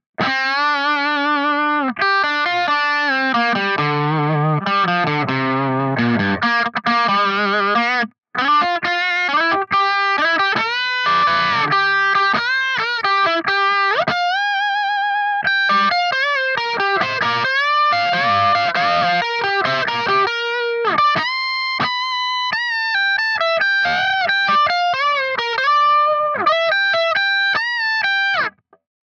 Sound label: guitar off